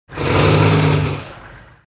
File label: Combine Rev; Frank; Cars